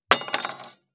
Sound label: drop, large metal long thin chef knife; AnalogousNonSpeech